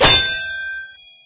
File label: blade out, roller; Garry's Mod; hl2